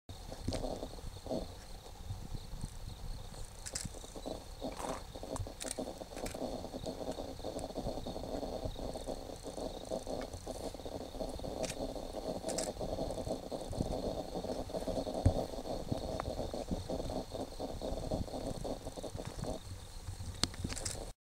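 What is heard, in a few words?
Sykes's nightjar, bird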